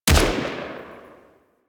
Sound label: Braton Vandal Redux Fire Shot; Warframe; Weapons